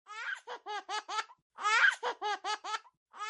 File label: giggle baby funny sound